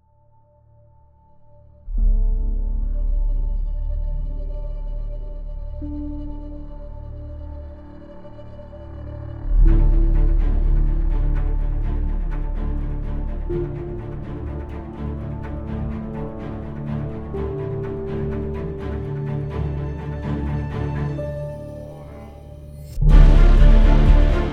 epic melody

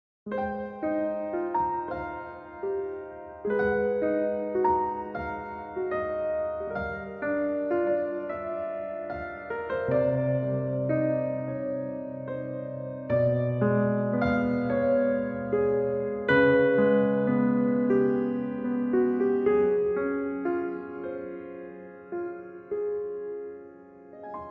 Smooth And Jazzy

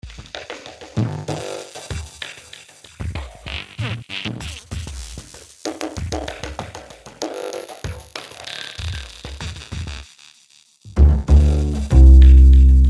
Funny Insects reduced

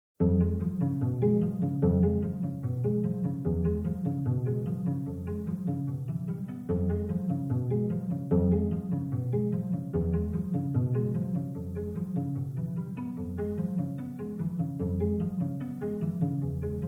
Short Pizzicato Cellissimo; reduced

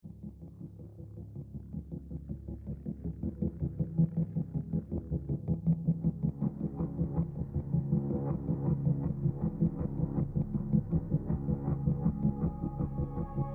Underworld with strings

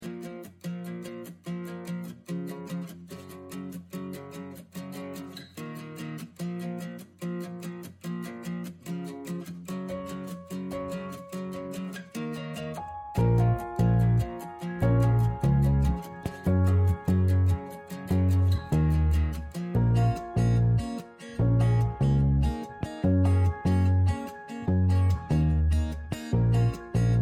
Acoustic Movement

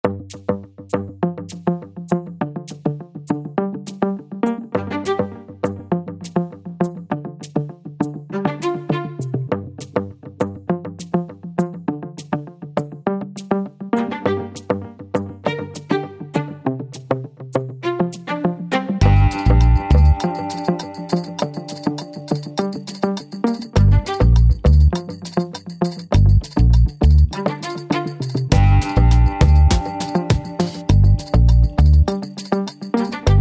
Funny Jumps

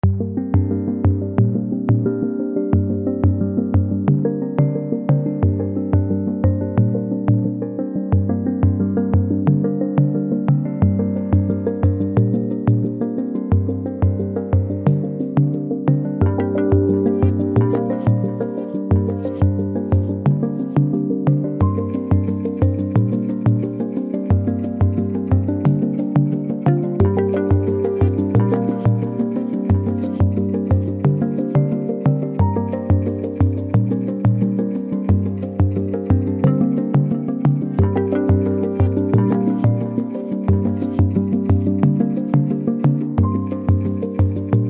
Pulsing Skywalk

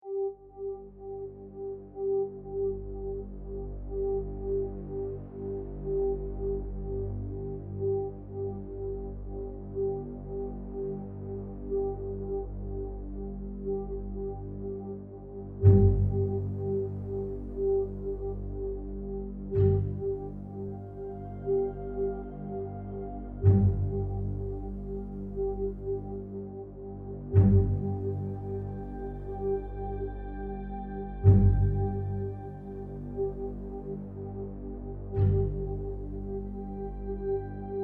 Pulse Col Legno

